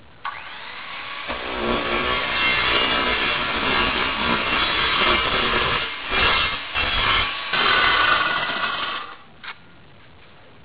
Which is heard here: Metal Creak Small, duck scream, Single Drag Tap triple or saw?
saw